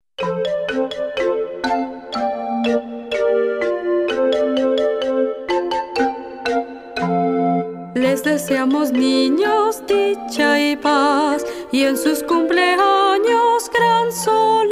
happy happy birthday words and music, childrens songbook spanish